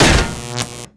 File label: neon sign hit world; tf2